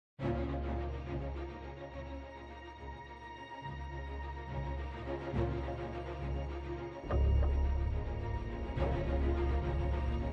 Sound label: Loop Tense